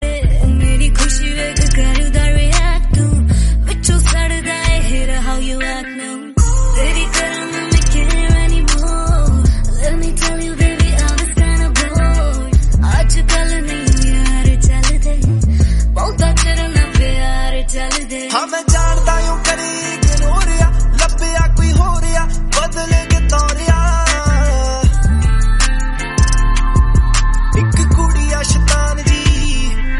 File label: Punjabi Ringtone; Resso Ad